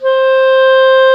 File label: Clarinet